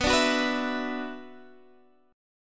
D 6th Add 9th B

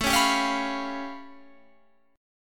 A Augmented 9th A, Guitar Standard; strummed; JGuitar

